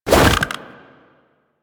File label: Infested Jetpack Jump Woosh; Warframe